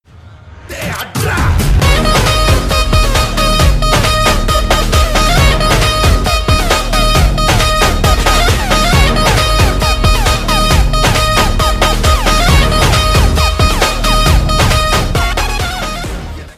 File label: bgm; tamil; vantha rajavathaan varuven